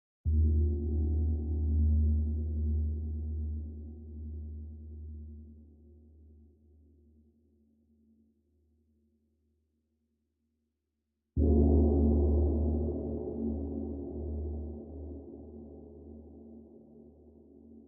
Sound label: Drums Only